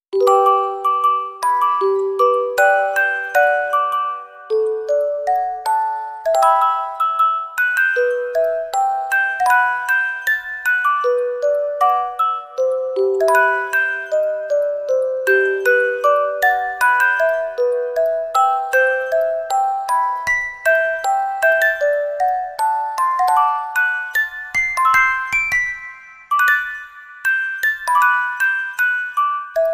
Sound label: Orgel-; Supreme Candy Soundtrack CD 2009